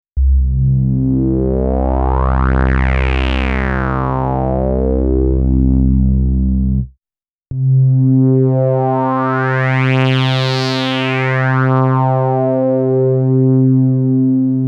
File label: svf lp sweep